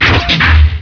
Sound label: clunk a chunk